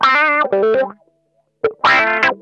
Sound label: WASPISH, Funk Guitar; Zero G - Funk Guitar